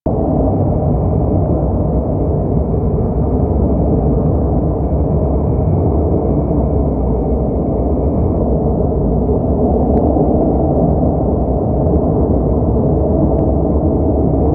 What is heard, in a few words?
Vent Tunnel Amb A; Warframe; Ambience; Grineer; Grn Interior